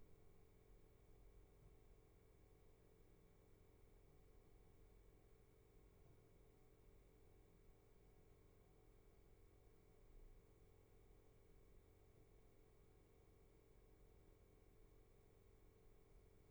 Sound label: roomtone